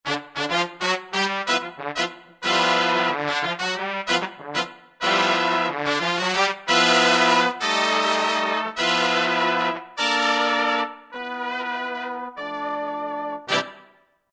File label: Muchas Brass; World; Latin; Roland SR-JV80-18 World Collection Latin